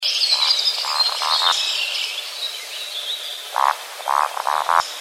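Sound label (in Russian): valdshnep, woodcock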